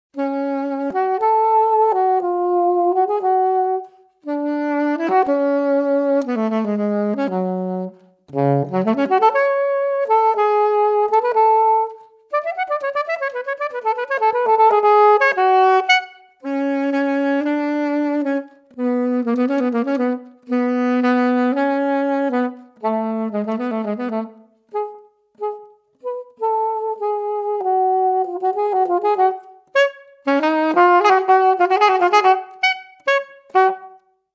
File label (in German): Klassik Solo